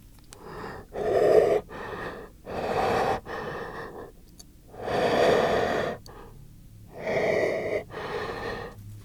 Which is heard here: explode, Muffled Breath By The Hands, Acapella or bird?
Muffled Breath By The Hands